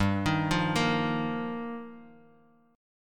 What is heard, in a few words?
G Minor 7th Sharp 5th; Gm7#5; arpeggiated; JGuitar; 15,18,15,15